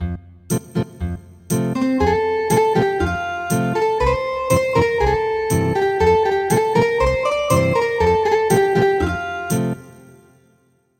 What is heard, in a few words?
Ethnic Guitar; Names of Days